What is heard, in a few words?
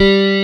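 G2 Telecaster, guitar; AKAI S-Series CD-ROM Sound Library VOL-8; SET#1 GUITAR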